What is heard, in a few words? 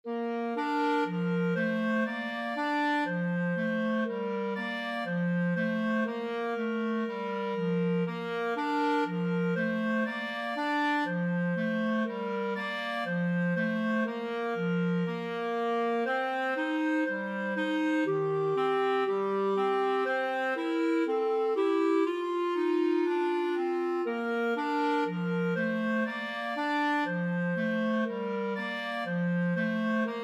clarinet duet